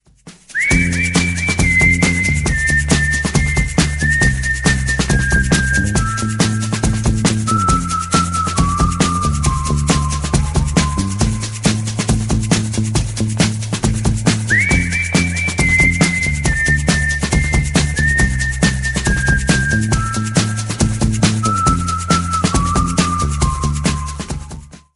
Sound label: Whistle Galaxy s3